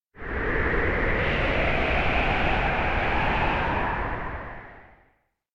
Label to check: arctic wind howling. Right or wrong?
Right